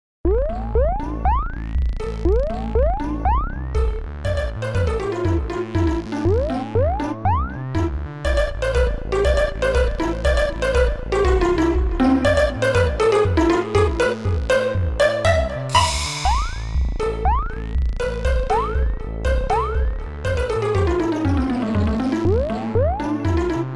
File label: synth 120 bpm; etude no 5